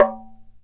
CUICA RIM; ILIO

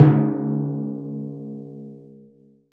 TIM, TIMPANI; Best Service ProSamples vol.55 - Retro Sampler; AKAI

